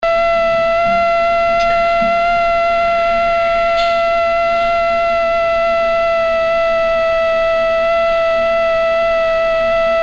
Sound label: mosquito